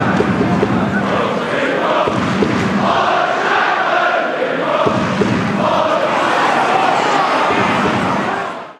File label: weve got, fan chants; lawrence shankland; hearts